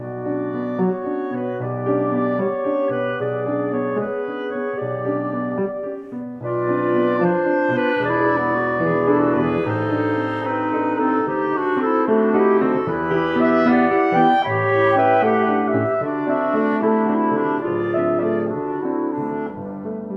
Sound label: Trio